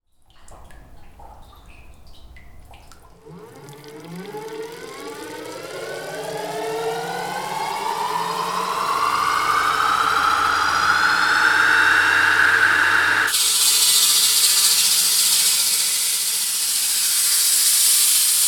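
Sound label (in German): Beton sägen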